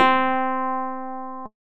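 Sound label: C4 acoustic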